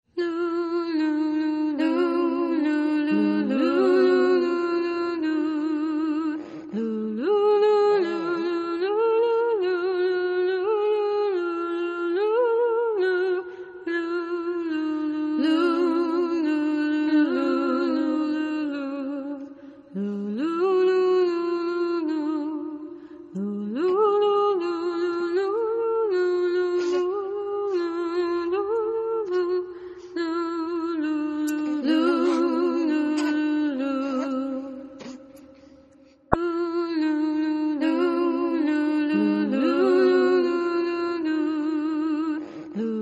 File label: duet